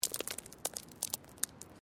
Stone Small Move; Material components for constructing more complex sounds; Rocks; Sound Effects:Materials

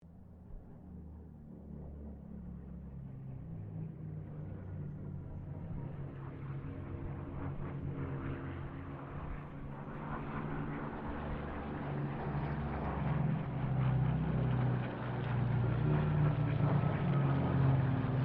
Spitfire Mustang Kittyhawk pass; Mixed aircraft squadrons; Airplanes; Vehicles

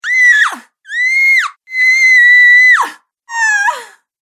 Female Scream Collection; Screaming Sound Effects

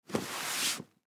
High Chair Pull, High Chair Pull Baby Out; Household; Miscellaneous Household

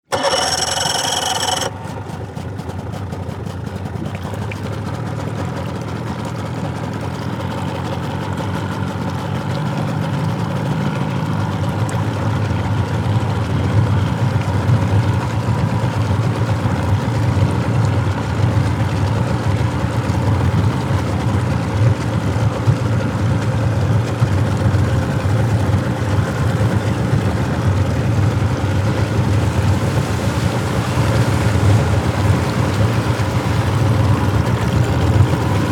Vehicle Dive Raft Start Up Idle Shut Off Boat; Boat Sound Effects; Boats (Sound Effects:Vehicles); SFX Bible 6

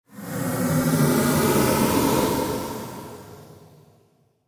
Sci Fi Spaceship; Sci Fi Spaceship Ascend Airy; Spacecrafts

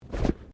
Body movement Clothing Foley

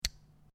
Lego Two Lego Pieces Clack Together; Miscellaneous Toy Sound Effects; Lynne Music

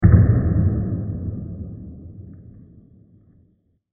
Depth Dark Diffuse Explosion; Explosions; CraftedSignals

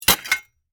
Grapple Impact, Grapple, Impact, Concrete; Warfare; Miscellaneous Combat; BlastWave

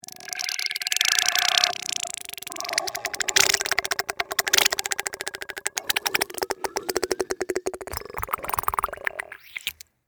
Beaker Boil Lab, Scifi, Laboratory; Scientist, Potion; Tech; Blast Wave

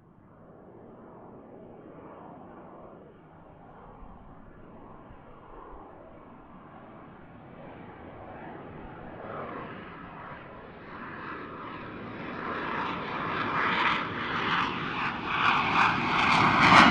AIRCRAFT FA-18 HORNET PASS

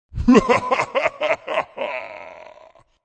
Evil Deep Laugh, Deep pitched evil laugh; Evil Laughs; Horror

